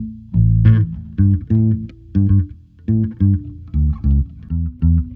Bass, Urban Grooves; Sonic Foundry (Sony Creative Software) - Stylus Pressure Urban Grooves on Digital Wax

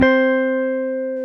Jazz Guitar

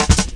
Drum Loops, Jungle

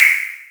snare, techno 138 BPM

